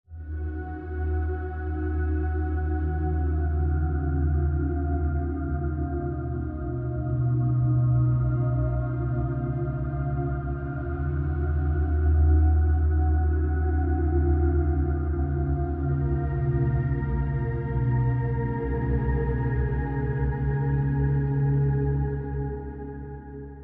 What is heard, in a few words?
Pad; Routed Sound; Spire Expansion - Flame Summoner Vol.1